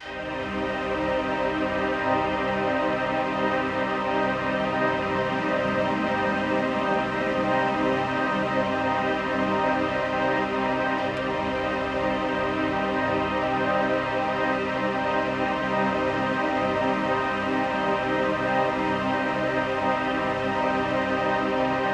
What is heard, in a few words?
Pad; neuro; InsideInfo